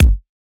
Kick, Interesting Drums

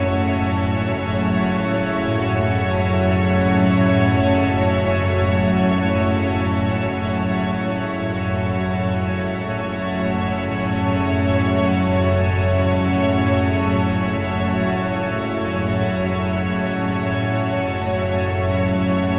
F#m7 Ambiance, Ambient String Loops; Suspense; Diffraction

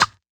Perc, Interesting Drums

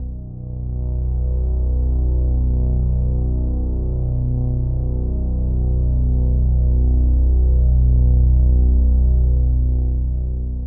90 B Bass, New Age Ambient Loops; Spring

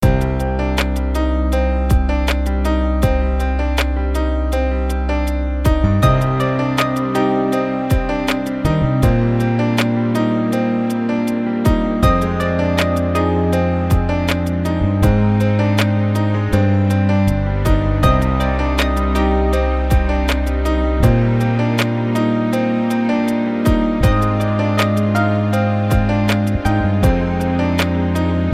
Reflection, background pads

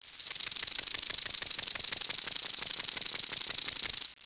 rattler, desert Sounds

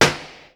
BANG, explosions gunshots

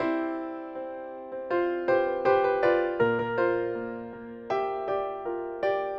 Delicate Piano

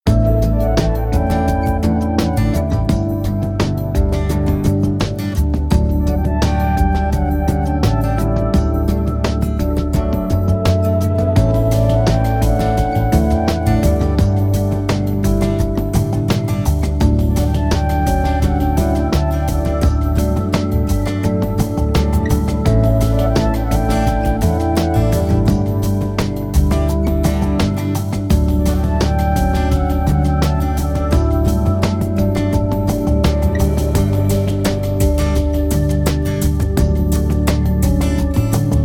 Music background pads

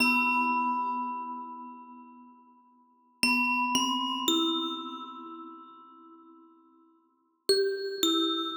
112 C Glockenspiel, New Age Ambient Loops; Spring

